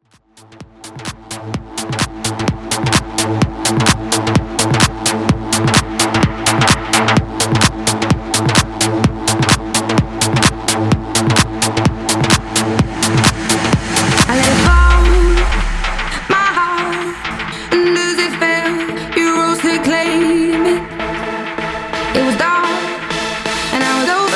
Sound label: Festival Mashup 128Bpm